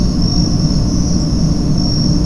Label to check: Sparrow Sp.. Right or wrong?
Right